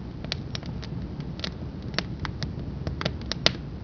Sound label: fire, crackling; SimScience